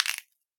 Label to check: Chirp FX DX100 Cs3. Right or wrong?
Wrong